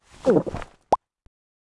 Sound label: Oddeye Attack